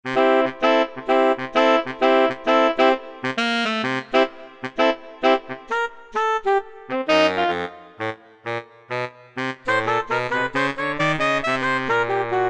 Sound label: Korg MSC-11S Brass